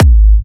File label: Bassdrum